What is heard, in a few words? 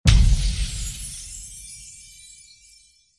Ancestor Explode No Swirl; Journey